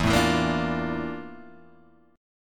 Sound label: F Major 13th E, guitar chord